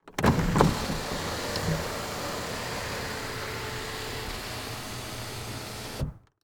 Transportation Chevy Trailblazer Open Sunroof Long